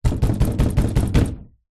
household knocking door wood hard rattle slight

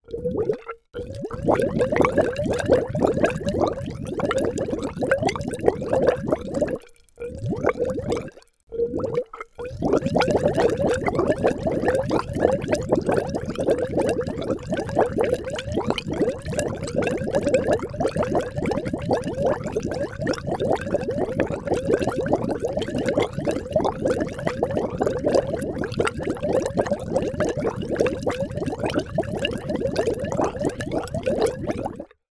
water gurgle in pipe air tube long

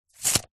industrial tape measure let go retract

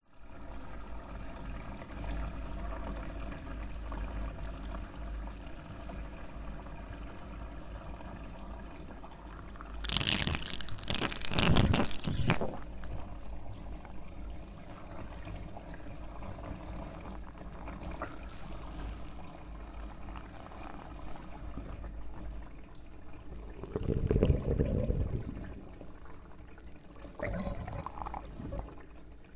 household bathtub drain bubbles gurgles; zapsplat

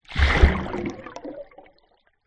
nature water underwater small splash hydrophone; zapsplat

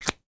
office pen marker lid put on; sound effects one

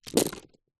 office crayons handful drop carpet

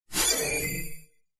game sound futuristic award unlock bonus

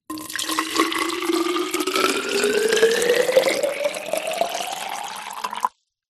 food drink water pour into plastic flask

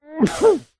human male man sneeze into cloth or arm muffled; zapsplat

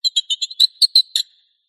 squeaky metal Designed Alien Bird; PMSFX

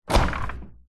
fridge door large metal open

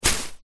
footstep single long grass; foley; zapsplat

